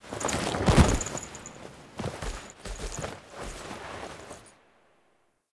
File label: Foley B; Drifter; Warframe; Quests; The New War; Narmer Outpost; TNW Snt Chamber Archon Intro